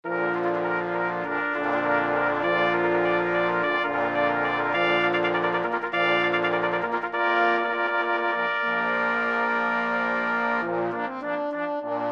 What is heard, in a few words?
Brass Quintet